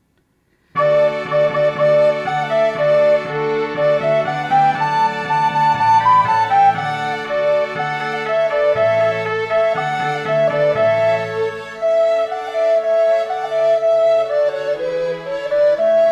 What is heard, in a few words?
march for small orchestra; vereinigte zwietracht der wechselnden saiten